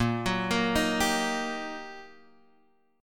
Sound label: G Minor Major 7th Sharp 5th, A sharp